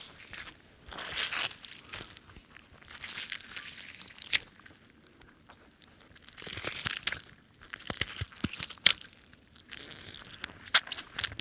Stabbing And Tearing Flesh

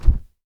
Wing Flap Flag Flapping

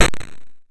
Crunch

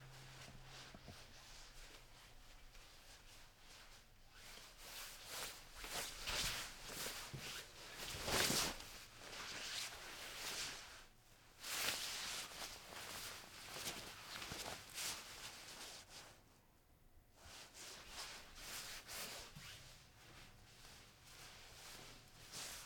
Jacket Material Friction

